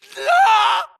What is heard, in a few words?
grunt death crush; Titanfall 2